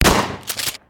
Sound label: shotgun